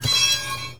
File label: Drop, INDUSTRIAL